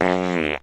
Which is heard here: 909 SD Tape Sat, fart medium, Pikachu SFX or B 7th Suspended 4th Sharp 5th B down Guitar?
fart medium